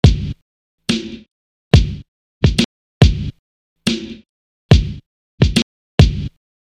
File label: sidechaining example drums